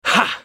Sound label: spell cast effort; Vladimir; Character Voices - English